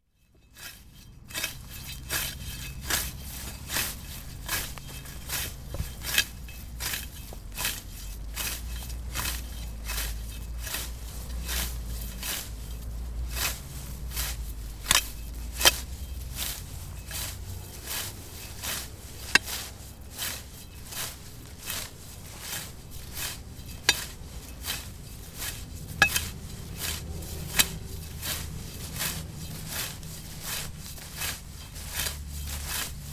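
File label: grass cutting, hand mowing; Chino Cultural Complex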